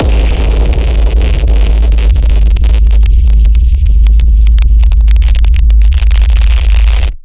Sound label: Impact deadly